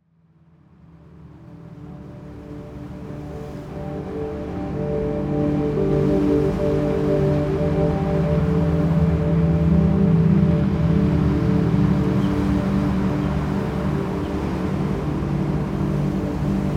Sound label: harmonics, meditation music